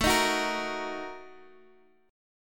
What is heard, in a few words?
A sharp Major 7th Suspended 4th Sharp 5th